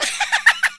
laugh, sound canvas

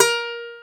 Domra A#3

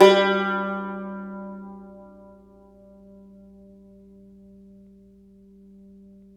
CUMBUS G#2

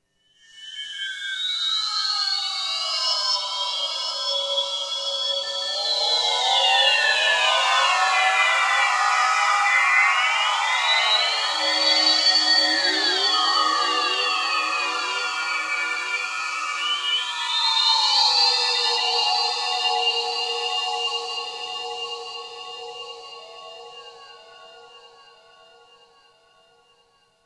ODD TONES DELAY BLUR; SLOW SYNTHESIZER WAVES

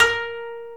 KOTO A#3; USB Soundscan vol.60 - Asian Traditions; AKAI

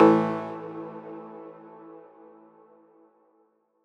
Am, Chords; M8; Electronisounds

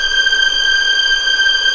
G6A LEG P, VIOLINS; East West; The Ultimate String Collection

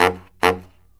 Killer Brass Riffs; USB Soundscan vol.29 - Killer Brass Riffs; AKAI; BARYT

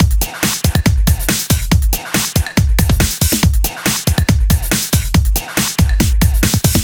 Break Beats, 140 BPM

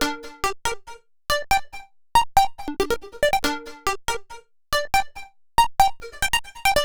Arp 140bpm G, Trance; Arps; Zero-G Trance Inducer; 90 s Sample CDs

